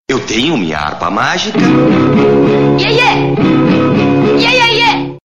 Harpa magica

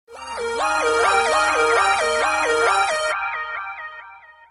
Sms Beat

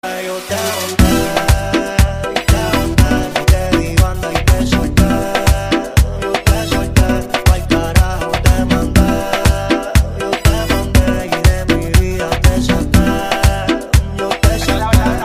mambo mix

